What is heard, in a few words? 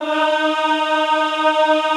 EHH D#3, Classical Choir; Best Service